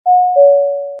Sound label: Ding Dong